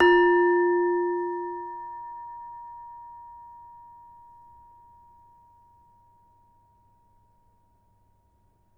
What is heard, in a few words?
Demung hard, gamelan; gravityharps; timbrebox